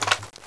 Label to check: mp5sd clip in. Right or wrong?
Right